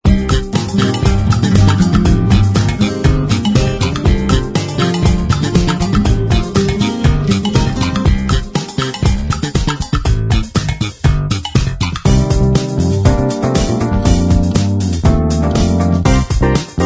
royalty free music

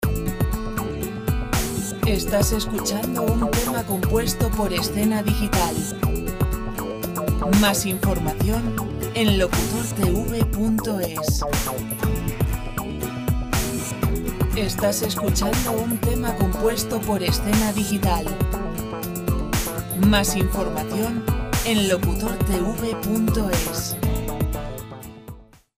120A guitar pop

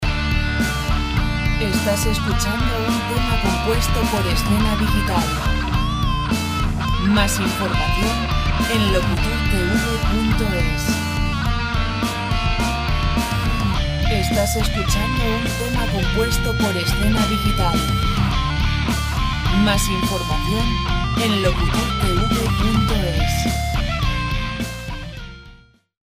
105 rock; Música de rock sin copyright; Música de rock sin copyright para documentales

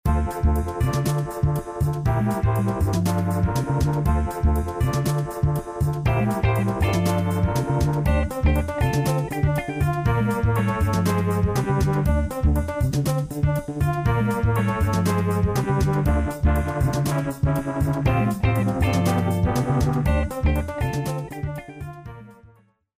musica 120 pop jazz D

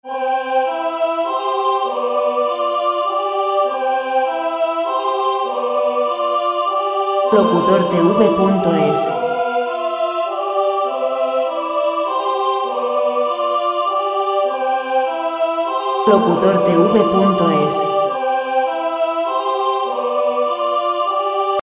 classic vocal 100

